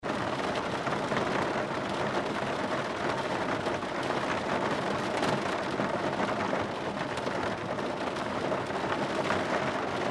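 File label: Rain Interior Car Pouring Roof; Roof Perspective; Weather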